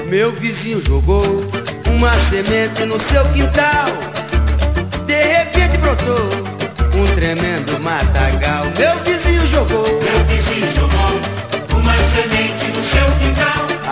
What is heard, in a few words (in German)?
musik; de rome